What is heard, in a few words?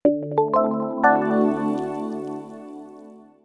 Flight Off; Samsung SGH-i320; System Sounds